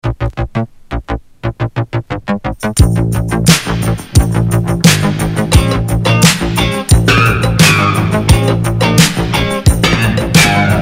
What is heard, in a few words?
Disco